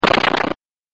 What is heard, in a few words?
breakblock